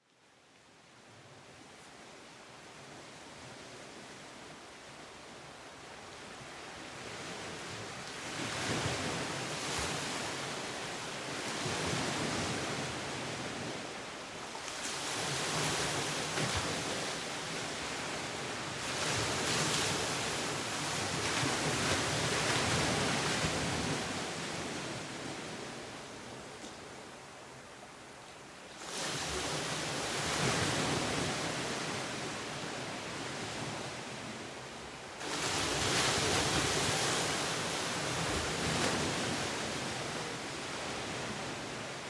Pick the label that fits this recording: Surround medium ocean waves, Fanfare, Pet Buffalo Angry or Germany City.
Surround medium ocean waves